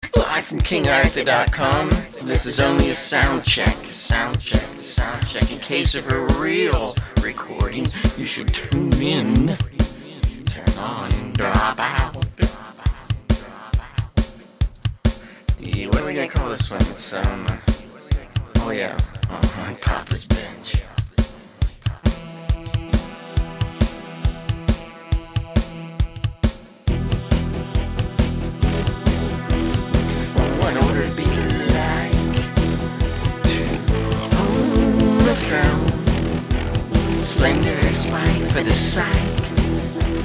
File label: Alternative Rock